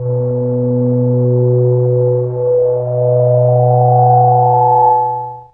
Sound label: Noisy Vibes; instrumente